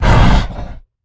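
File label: Stealth Attack Grapple; Evolve; Goliath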